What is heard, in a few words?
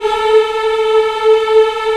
VOX African; Roland LCDP11 Africa VOL-1